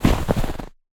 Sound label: snow footsteps run; Bluezone Corporation